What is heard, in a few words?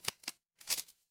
paper fabric inventory